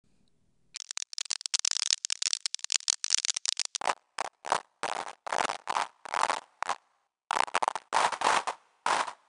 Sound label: Stix Crun